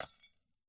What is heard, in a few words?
glass break; angband